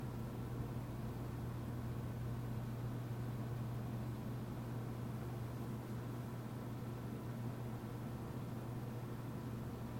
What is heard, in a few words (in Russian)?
gulkij dalyokij drebezzhashij zvuk raboti proizvodstva